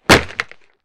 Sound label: Football Catch Gear, football